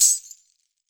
wrist, world percussion; World Instruments 2

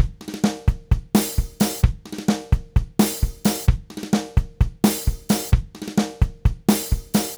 Tango, Latin Percussion, Drumset; Sonic Foundry (Sony Creative Software); Joe Vitale Latin Percussion

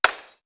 CHINA BRK; East West; Global Sounds; D4 FXS

